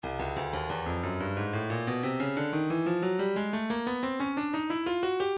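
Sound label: breakbeat midi files 090 091 bpm tambourine; bozzy tamb; Stylus Breakbeat Midi Files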